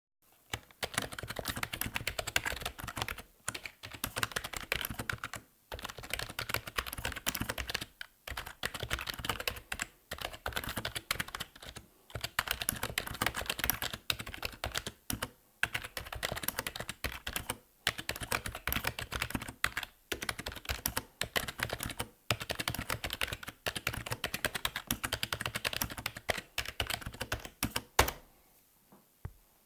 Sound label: Corsair K57 RGB Wireless